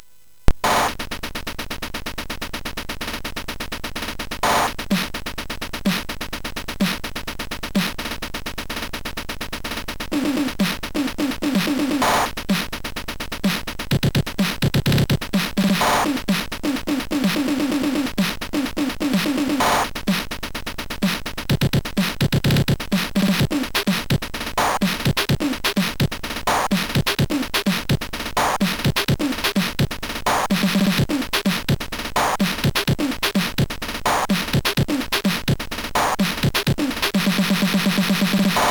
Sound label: Rythmtraxx sid MOS6581R4; hvsc